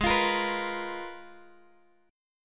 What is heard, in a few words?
Ab Minor 6th Add 9th, down, Guitar, Open F; Abm6add9; strummed